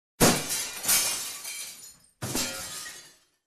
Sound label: Shattering Glass